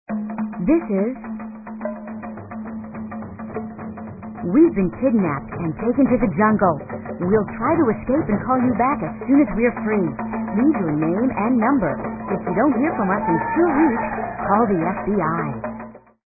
African Drums

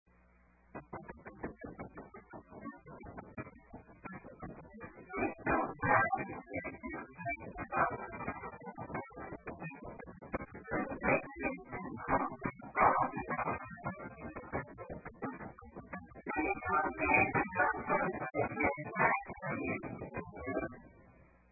80s Music

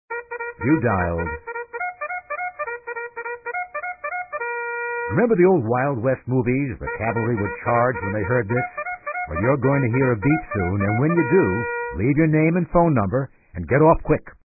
Bugle Charge

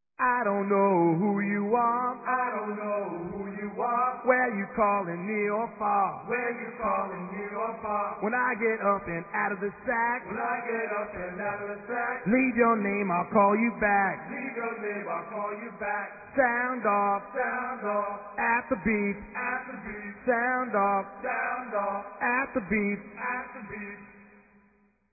Military Rap; DontHangUp RockNRoll

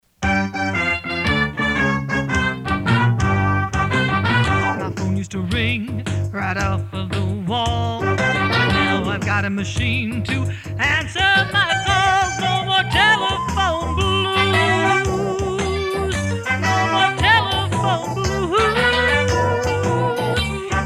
Telephone Tones Telephone Blues; ATT Telephone Tunes

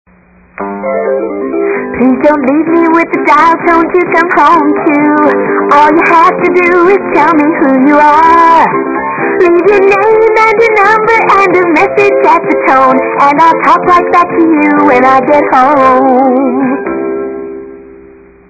country; RadioShack

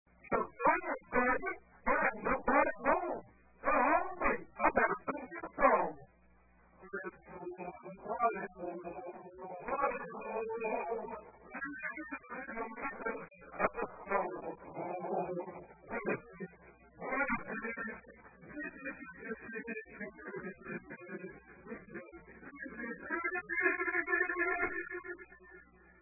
Beep Song

